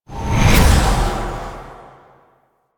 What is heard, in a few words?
Wellspring Cast; Warframe; Focus; Zenurik